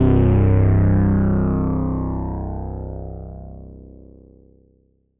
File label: BASS Cyberpunk - C, Synth One Shots